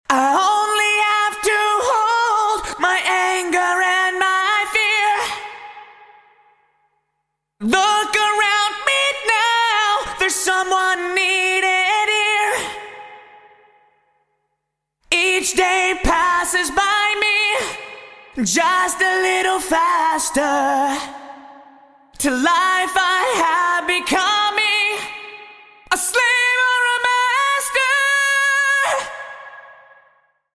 Vocal Yamaha SPX900 Plate; Vintage Digital

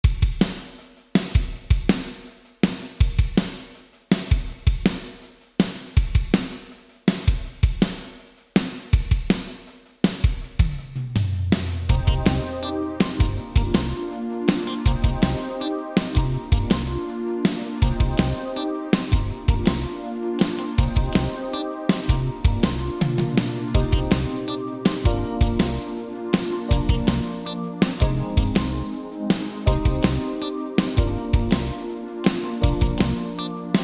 Drums Eventide H8000 Drum Chamber; Vintage Digital

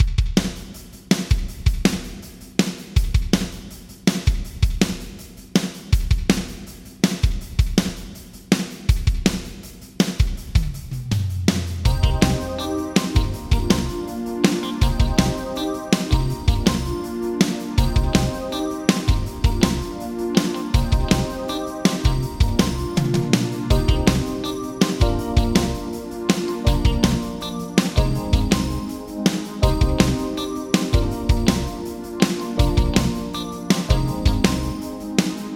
Drums Yamaha ProR3 Pool; Vintage Digital

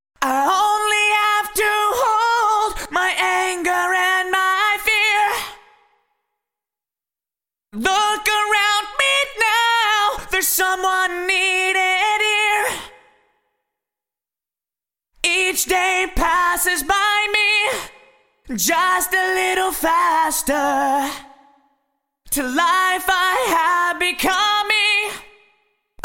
Vocals TC Electronics Reverb 4000 Drum Ambience; Vintage Digital

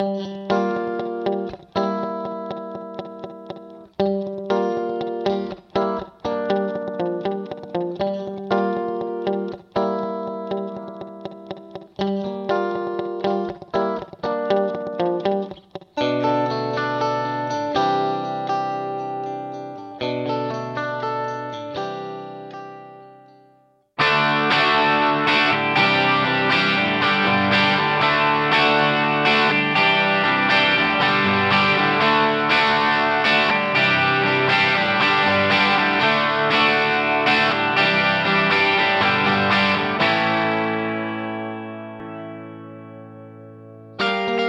Guitar Yamaha Rev7 Live Reference; Vintage Digital

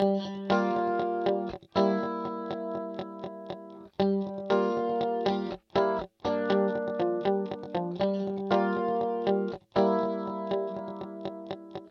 Guitar Eventide H8000 Dual Chorus; Vintage Digital

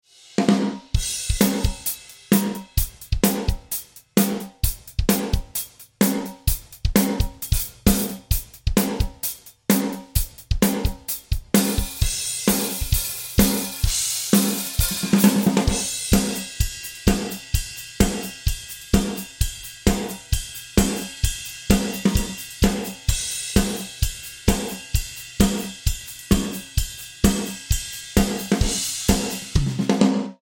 Drums Klark Teknik DN 780 Gated Hall; Altiverb

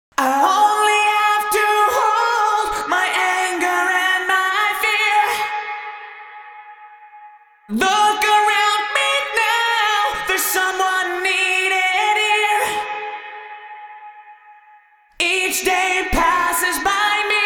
Vocals Ursa Major Space Station Cloud Rev Medium

